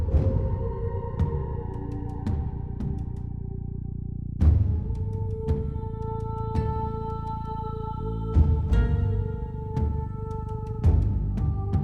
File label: UI Menu Music; Avakot